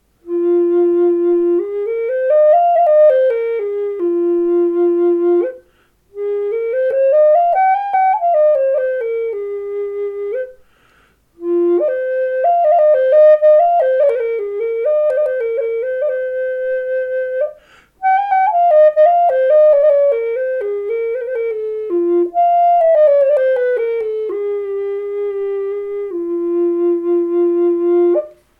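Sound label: F4 Spruce, Native Style Flutes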